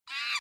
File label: Monkey